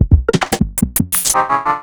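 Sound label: Roland MV8800 drum GANGSTA KIT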